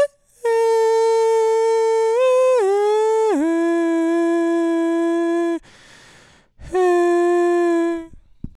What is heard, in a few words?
Male Ambient Lead Voc